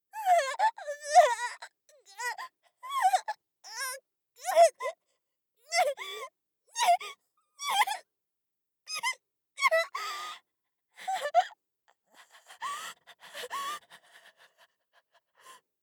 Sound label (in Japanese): 振り払えず固まる; 効果音なし